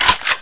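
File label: hk416 silencer off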